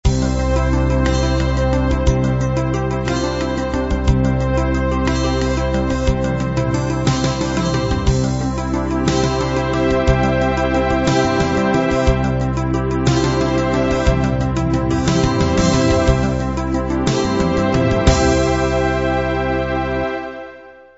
new age

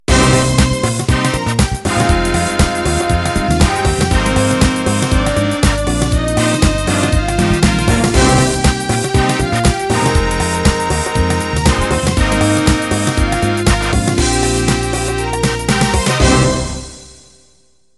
House Garage End

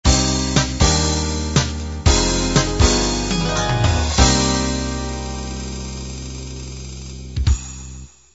half 8-16beat end

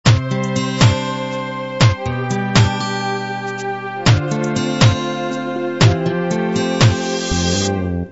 16 beat ballad intro

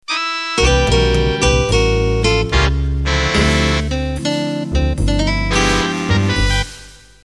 Slow Jazz Intro

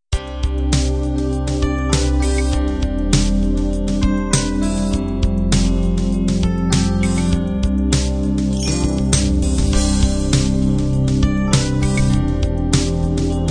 groove ballad intro

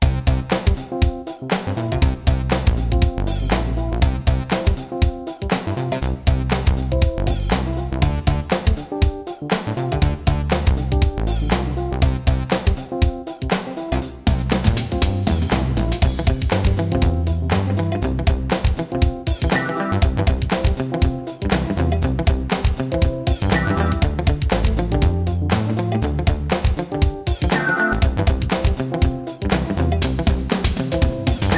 Rap

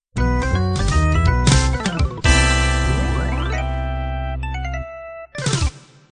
b3 jazz end

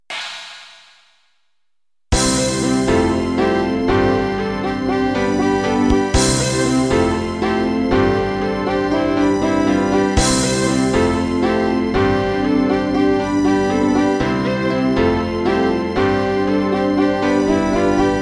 House Intro

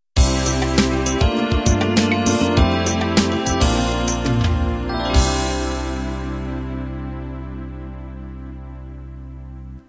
slow ballad end

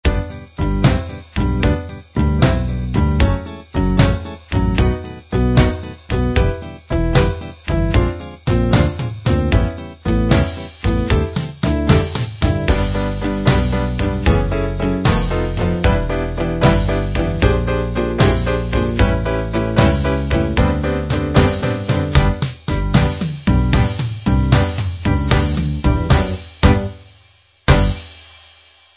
burlesq

